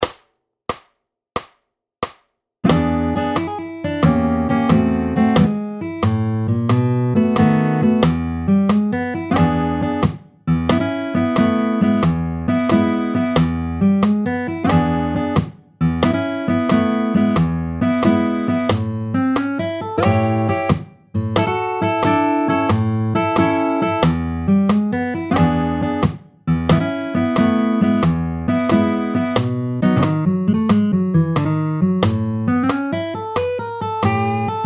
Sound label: Slow & Groovy Fingerstyle Blues Practice Routine